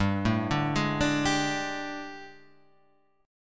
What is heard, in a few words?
G Suspended 2nd Add Flat 9th G, chord; Gsus2addb9; sus2addb9:1,2,(5),b9; 3,0,0,1,3,3